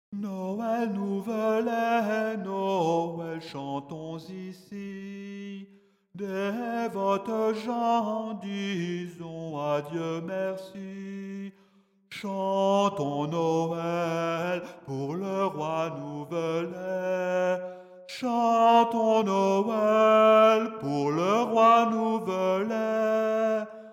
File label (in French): noel alto